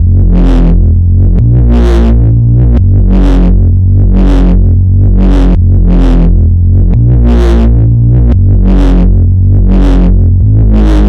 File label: Helocon Bass, Drum n Bass; KJ Sawka; Drum n Bass & Beyond